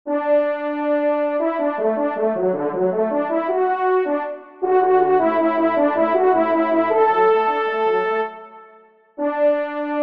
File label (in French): trompe; Halte au retour de la chasse; F. Vannerom